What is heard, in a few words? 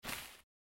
Footstep sand; this is the zodiac speaking 2020 gamerip switch ps4 xbox one pc macos